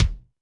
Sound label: Kik Chunky, Drum Machines Alesis D4